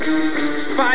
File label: bad spin; usa